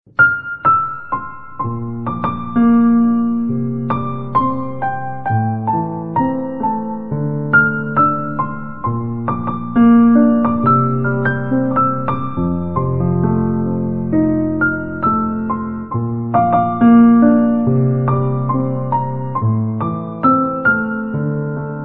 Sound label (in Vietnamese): Piano Khong Loi